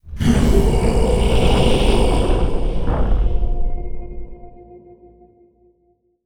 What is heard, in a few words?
lithoid levitating; lithoid portrait; Stellaris